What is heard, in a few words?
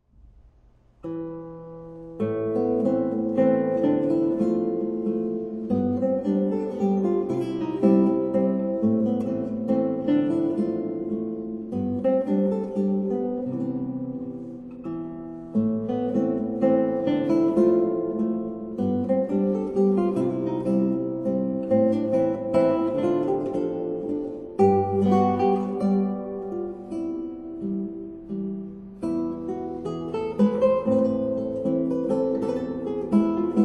Valse A minor Guitar; Chopin